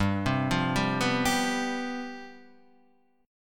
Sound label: C Major 7th Suspended 4th, G, guitar chord